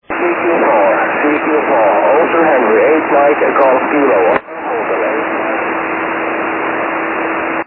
70mhz; oh8mgk

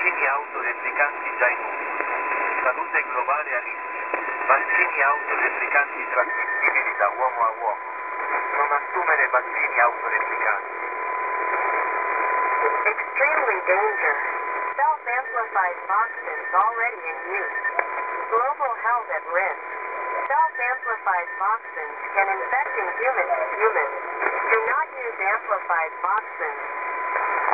websdr recording 7000.0kHz

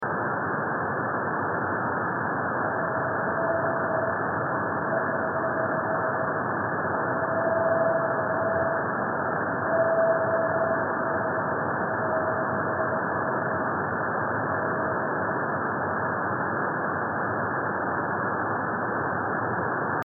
meteor

